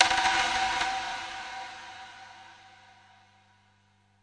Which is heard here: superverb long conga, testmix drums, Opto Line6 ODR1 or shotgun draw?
superverb long conga